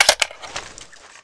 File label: m4a1 clip out alt